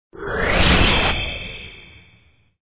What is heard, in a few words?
switch; DoubleNewMagicTower